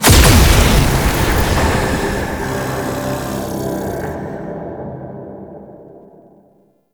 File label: Portal Shatter Open; Warframe; Duviri Murmur; Ambience